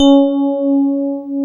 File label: PIA DX E-PIANOS